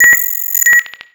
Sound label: beep reject